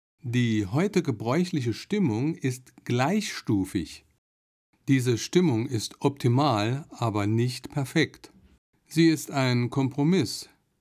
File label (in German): temperierte Stimmung